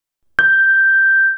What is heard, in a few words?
Piano G6 sinusoid